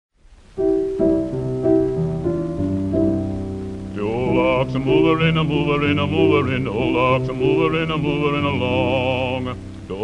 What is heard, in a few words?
Spirituals; Paul Robeson; De ol ark's a-Moverin